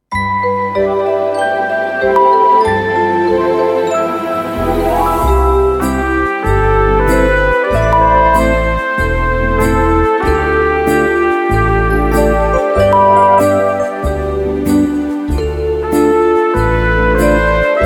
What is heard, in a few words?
XMas Slow Swing 95; unbeschwert